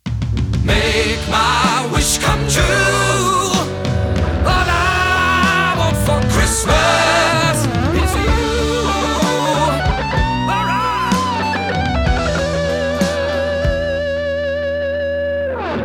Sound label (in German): Gesang